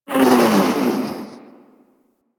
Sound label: Uzi Tail Wind Down; Warframe; Tenno